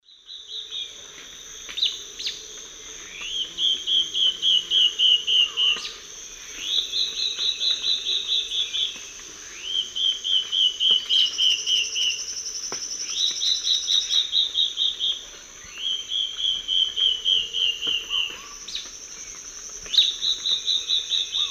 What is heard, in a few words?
Myrmoborus myotherinus, calls and duet; S. America; Eastern Lowlands